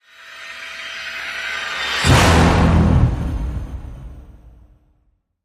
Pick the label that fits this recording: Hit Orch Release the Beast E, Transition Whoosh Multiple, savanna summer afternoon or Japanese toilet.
Hit Orch Release the Beast E